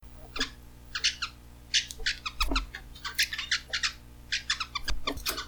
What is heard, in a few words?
bronze winged mannikin call